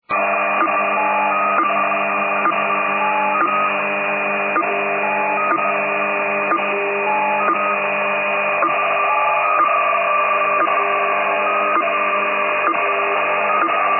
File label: 2232.5 kHz